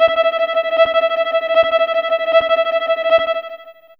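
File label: Violin Tremolo E4, Fairlight CMI IIe; TremStr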